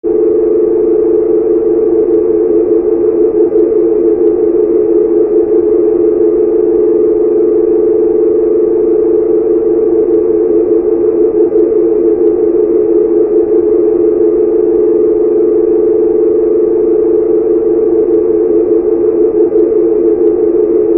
Energy Modulation, Energy, Modulation, Flutter, Drone